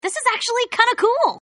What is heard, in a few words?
win; Power Rangers vs Teenage Mutant Ninja Turtles - Ultimate Hero Clash 2; power april